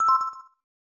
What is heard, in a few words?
Jewel Chime; Doraemon 2 Nobita no Toys Land Daibouken; SNES